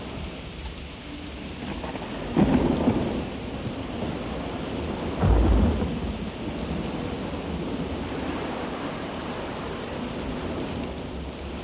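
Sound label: Monsoon Background; Platoon; Ambiances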